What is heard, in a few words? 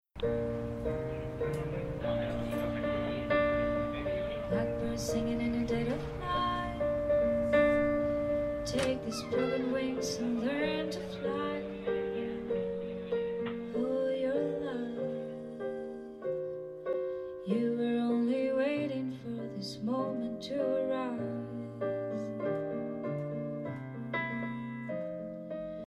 female english song; ideasonora